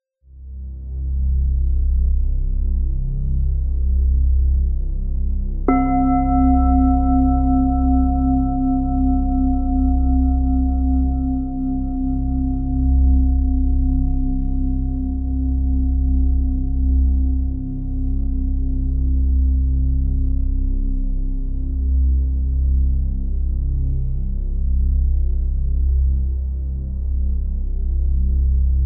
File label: GONG